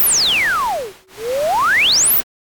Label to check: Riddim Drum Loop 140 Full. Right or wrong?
Wrong